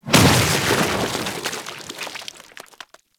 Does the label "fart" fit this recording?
No